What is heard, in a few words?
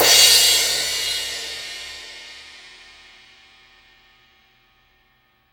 crash; East Collexion; Drum 1 Dry